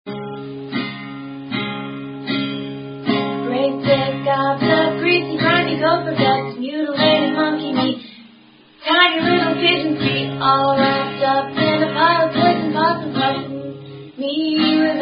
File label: love song